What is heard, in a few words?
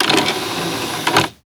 computer disctray; soundscrate